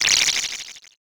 text anim

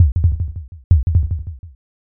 heartbeat

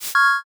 world move

